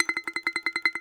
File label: BAGLAMA T1IL; E-MU Producer Series Vol. 7 – Old World Instruments